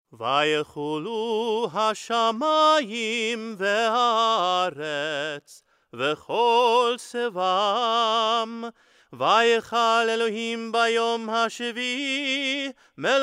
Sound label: shabbat